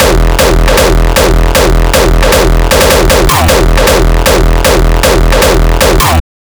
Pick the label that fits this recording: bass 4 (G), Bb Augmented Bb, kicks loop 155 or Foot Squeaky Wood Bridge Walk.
kicks loop 155